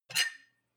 cutlery take from plate; berufe; gastronomie; soundpool

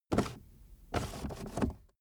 car accessories cup holder cover open slow

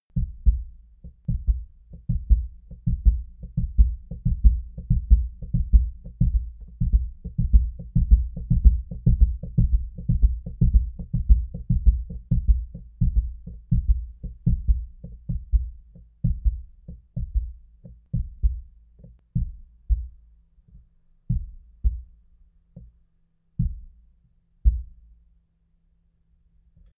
heart beat full sequence

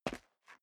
step dress boots concrete grit single stair down; Footsteps; schritte

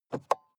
sports car int compartment open soft; verkehr-transport

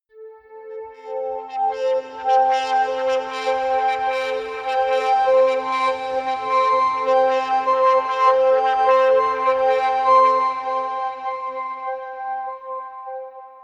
atmospheric feedback pad high, drones; musikelemente

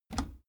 bicycle brake mechanics release

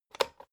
electric whisk insert whisk hard, kitchen; haus-garten; kueche

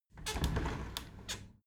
window handle squeak turn open halfway soft

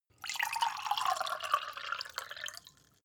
glass pour; soundpool

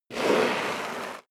step sneaker concrete grit slide long; schritte

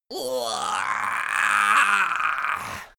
imp die; schreie

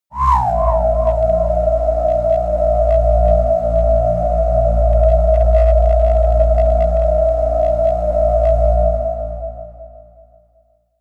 air drone whistle, drones; musikelemente

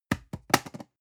storage fall table hard, box; objekte

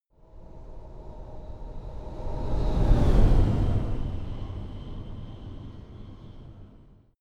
sci-fi vehicle train passing long; raumschiff

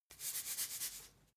salt shake

